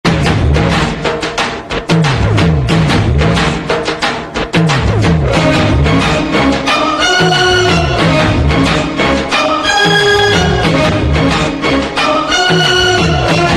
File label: bgm; chandrolsavam; mohanlal; meena; kushboo